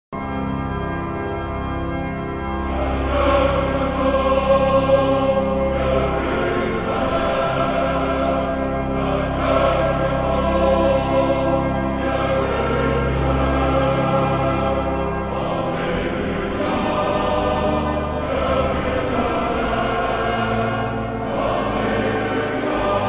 Paas intrada

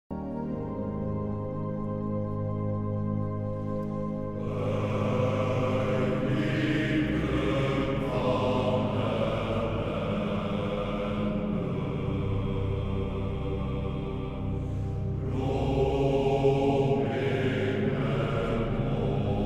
Psalm 130, mannenkoor; vers 1 en 4; dirigent Freddy Veldkamp uit Hardenberg

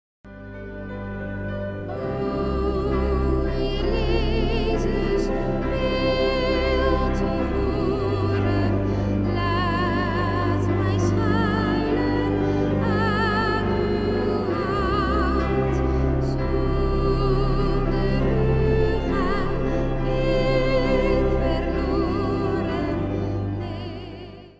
Gebed om vergeving, mannenkoor

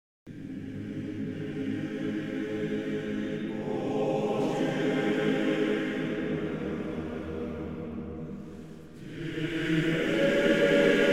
Tebe Poem, mannenkoor

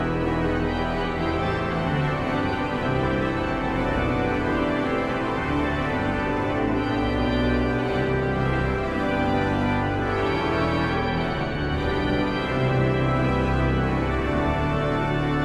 Psalm 113, mannenkoor

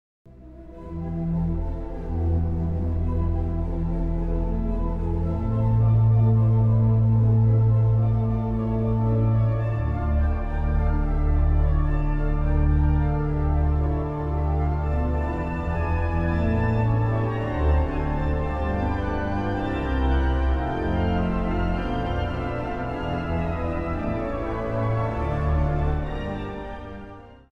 Orgel Intermezzo

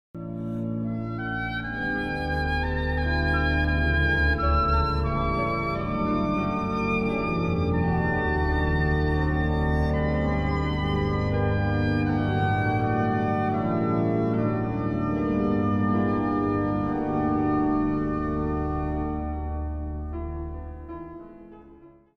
Instrumentaal Intermezzo

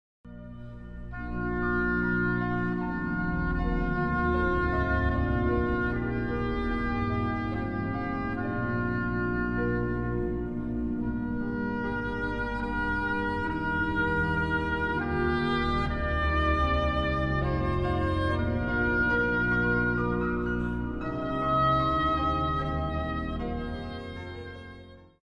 Instrumentaal Intermezzo

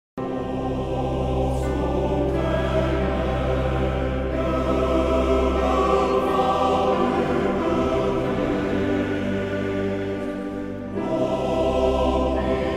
Psalm 119, mannenkoor

